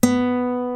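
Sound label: Guitar B2; Mo' Phatt; Digital Sound Factory Mo' Phatt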